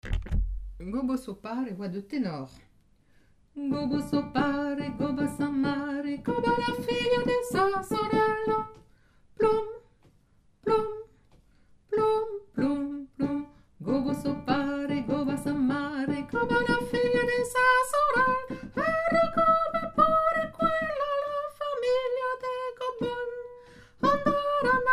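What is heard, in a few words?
soprano tenor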